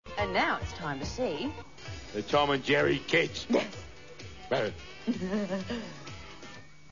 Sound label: LAUGH